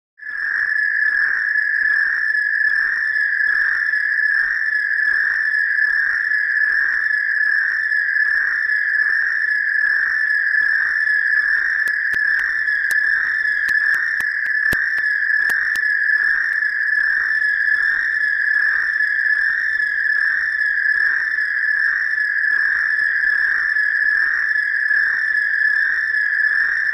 Pulsating high frequency electronic signal; radio signals

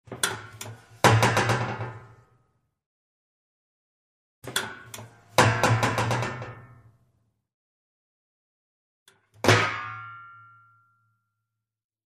Washing machine lid opening; laundry

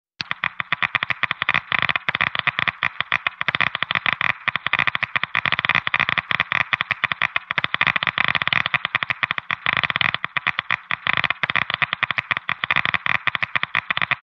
Geiger counter; radio signals

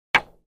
Bow, arrow hits the target; cold weapons

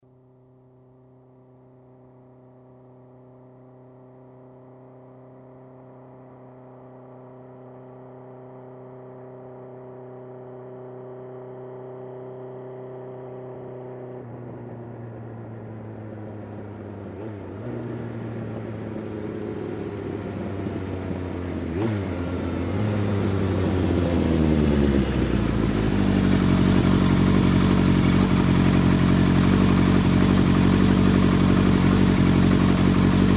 Motorcycle approaching, turning off

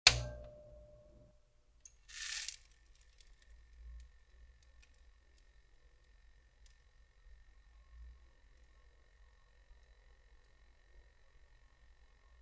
Turn on, turn off the old monitor; computer

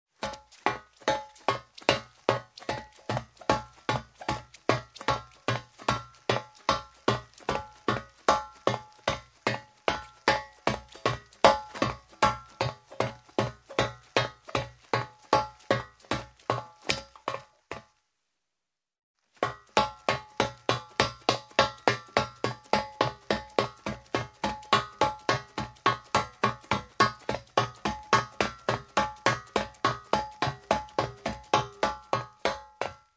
Running on the metal floor in shoes; steps

